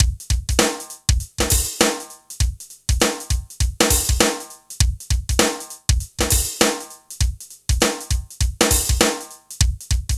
HIPHOP, Funky Xtreams; INIS

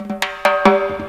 FILL TIMB, Ragga & Dub Reggae; USB Soundscan vol.27; FILLACOUS

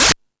Discoball, E-MU Pro-Cussion

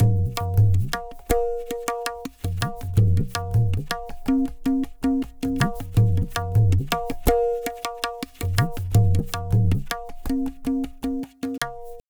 Pure Tabla, 080BPM; Zero-G

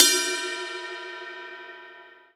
Jzz Cup Ride; EMU Orbit-3

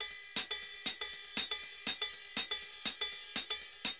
RIDE, SAMBA; Best Service ProSamples vol.14; AKAI

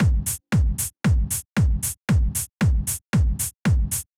Drumloop 115bpm, Nu Disco; Zero-G

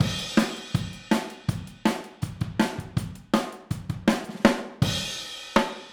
Overhead ST, Drums, 162 BPM; Drums from the Big Room The Mixes; Take Your Toms Off; Chorus 04

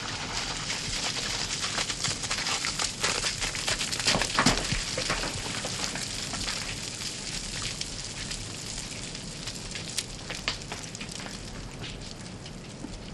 Rockfall, On The Glacier

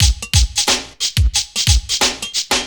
90 DRUMS

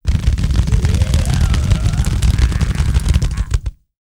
CHEST ROLL, World Voices, Afro noise; ILIO; Vocal Planet VOL-5

